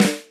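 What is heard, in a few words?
drum very fast; osu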